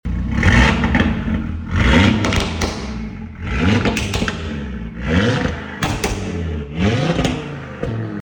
audi tt rs abt sportsline ttrs r mrc stage 3 coupe modified, 2.5 TFSI Quattro S-Tronic; 2017; 8S; 591PS; 1 of 50; mythos black; UK